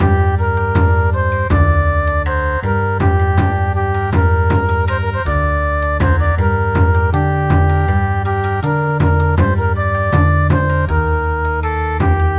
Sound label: Bon Odori, midi; A little children